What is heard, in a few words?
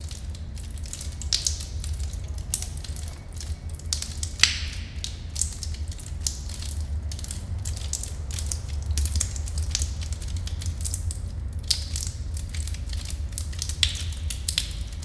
Water Drip Cave; drips